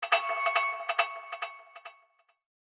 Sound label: alarm tone ringtone; lenovo